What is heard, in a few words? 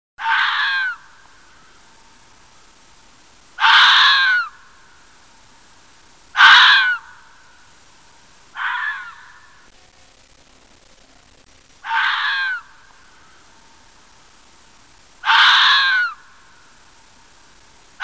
Vulpes vulpes; voice